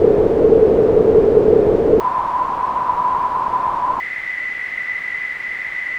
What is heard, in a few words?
filter noise